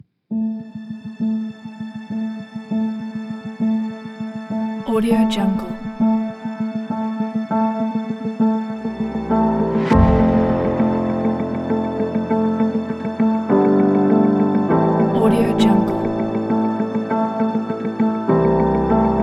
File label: the ambient music